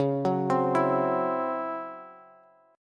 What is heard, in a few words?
C sharp Minor Major 7th Double Flat 5th, Bass; 21,21,22,21